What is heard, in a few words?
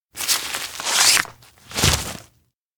fold umbrella open; anemoi